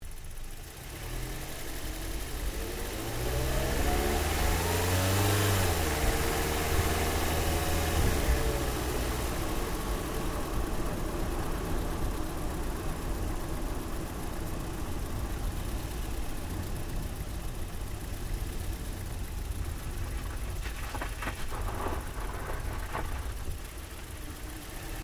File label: Car Toyota Yaris engine drive; Toyota hire car; Cars; Vehicles